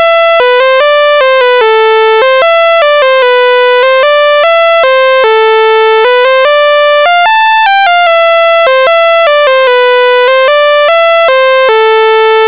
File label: tetris raw sawtooth; Python